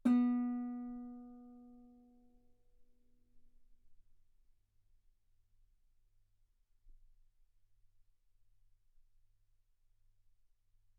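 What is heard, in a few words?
Guitar ff B string B3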